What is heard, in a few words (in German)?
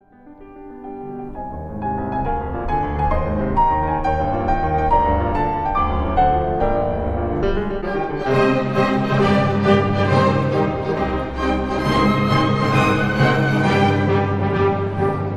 Klavier Konzert; Stuttgart; Pro Musica